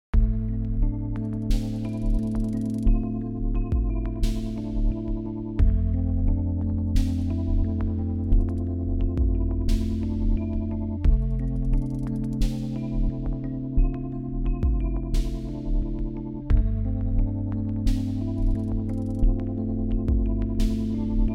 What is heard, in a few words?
Rhodes n Synths - Synths Slower Tempo with retro outro